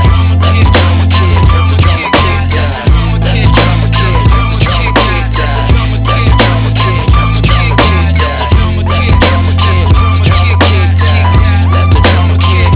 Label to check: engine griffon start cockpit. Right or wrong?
Wrong